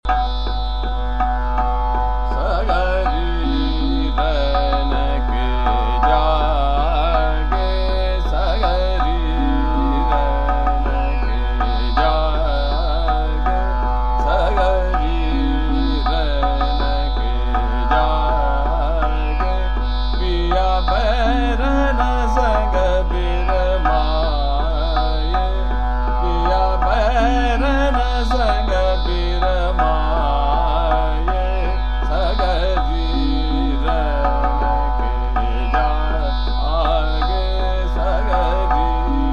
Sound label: Chota Khyal - Trital, Gaud Sarang; Sagri Rain Ke Jage